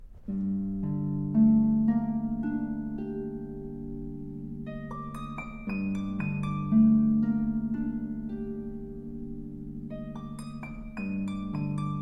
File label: harp